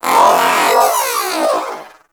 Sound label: Zanuka Companion Vocal Death; Pets; Warframe